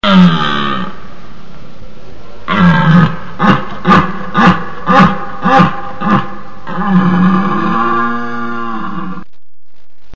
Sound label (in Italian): verso cervo